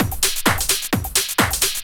drt 130 addon, DRUMLOOPS, LOOP DANCE

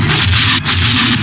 ION BLAST; STARS FXS; East West; Global Sounds

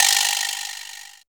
vibraslap; Cuba

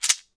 SHEKE, Afro Shakers, Shekeres

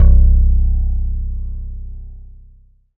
Bassnote, Prototechno; Sonic Foundry (Sony Creative Software); Basses

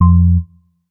bass classic F; Polyend; ARC Noise

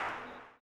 CLAP, Blues Soul; Spectrasonics Vocal Planet

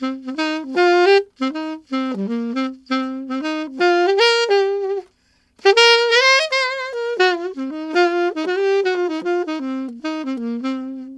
Alto Shuffle in C min, Alto Sax; Sonic Foundry (Sony Creative Software)

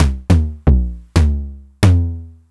909 mod LT - LOW TOM

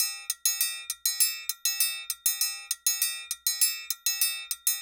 Cajun Triangle

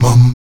VOX MUM B 2D, Afro Chants; Roland LCDP11 Africa VOL-1; Stacc Mums

